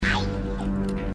ieee, grunts

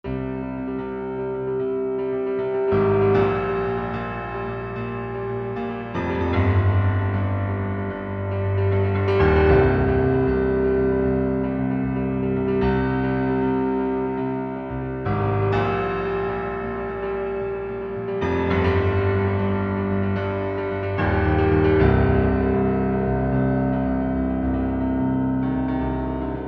battle sketch; halo musical outtakes

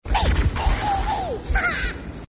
meme haaaaa, grunts

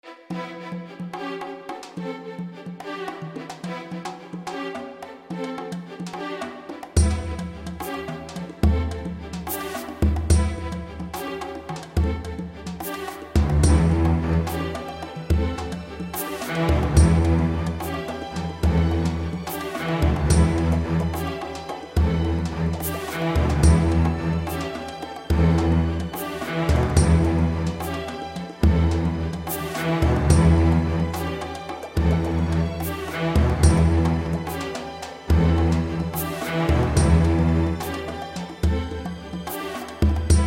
rhythm variant sketch; halo musical outtakes

